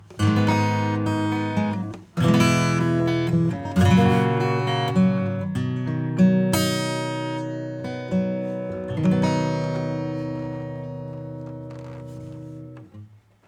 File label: Acoustic